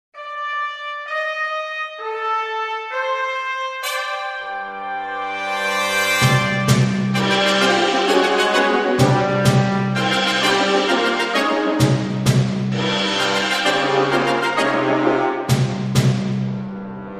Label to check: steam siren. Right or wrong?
Wrong